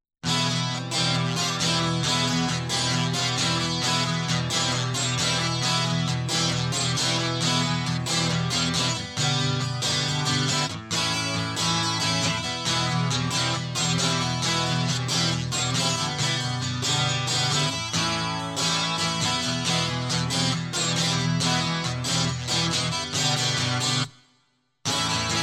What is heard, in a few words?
Guitar Loop